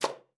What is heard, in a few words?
KLPB G3, ALTOSAX; Xsample VOL-2